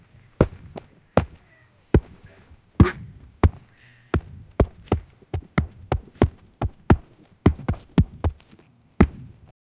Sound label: massive heavy stomps of a, mammals